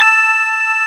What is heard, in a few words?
SYNTHESIZER DIGI DECAY